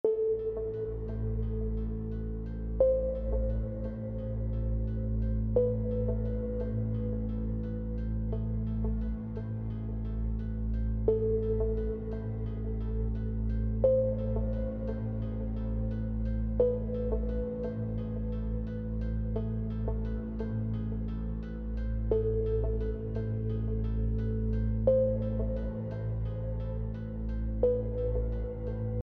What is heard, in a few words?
Sinister Instrumental Music